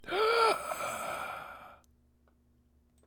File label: gasp; look outside